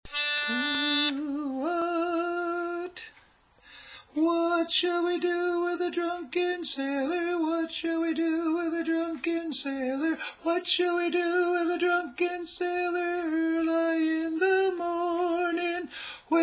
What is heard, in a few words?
Drunken Sailor (Tenor)